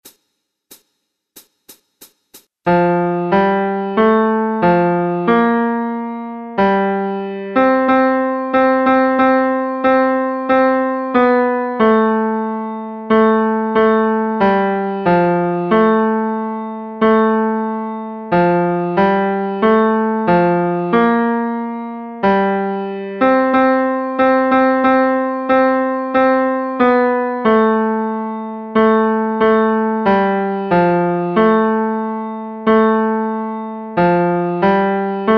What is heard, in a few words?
Instru Tenor; Messe de Saint Jean Agnus